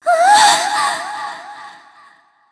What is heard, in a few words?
Gasp Slow Motion; Zelda gasping (slow-motion scene, Impa's force-field breaking); Skyward Sword